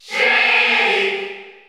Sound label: Cheer Spanish; Sheik; SSBU